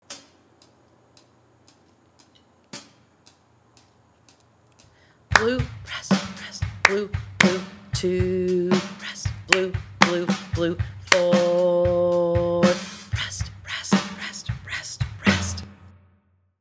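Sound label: Summary in 5 4 with Drum Track Blue Jello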